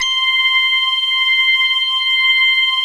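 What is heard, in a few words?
JUNO ORGAN, PADS; Masterbits; Vintage Voltage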